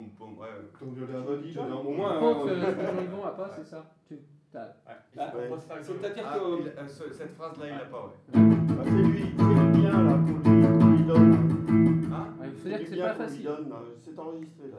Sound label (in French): rythme guitare